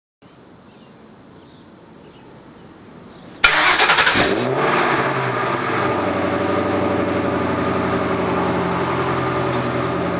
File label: startup, motor sounds